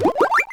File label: Lakitu Block; New Super Mario Bros Wii